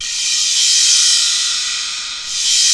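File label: Reverse Cymbal, Fairlight CMI III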